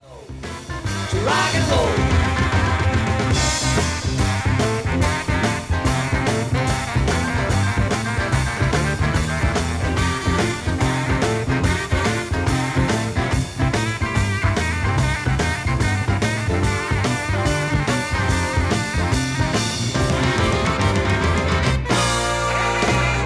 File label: Sax Solo